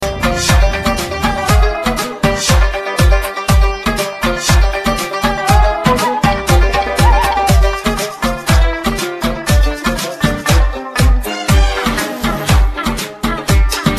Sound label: arabi tone; ringtones